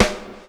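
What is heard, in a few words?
SNARE DRUM; E-Lab; X Static Goldmine 2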